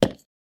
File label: footstep